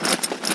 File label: c4 disarm